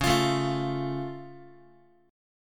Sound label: C sharp Augmented down Guitar, chord; x,9,8,7,9,x; strummed; JGuitar